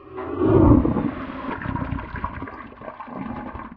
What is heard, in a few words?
flush, materials; Garry's Mod; hl2